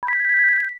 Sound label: psi; mother2; PSI Attack